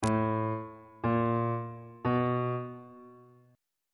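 e sharp diminished 4th perfect 4th augmented 4th intervals on bass clef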